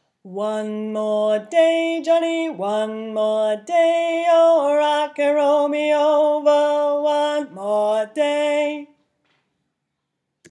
One More Day bass

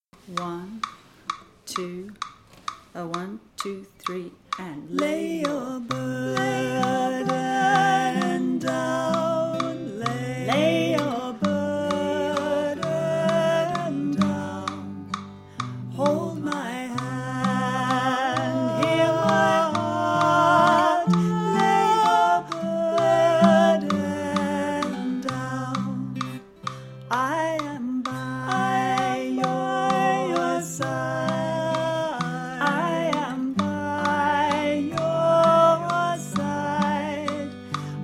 alto-bass click panned

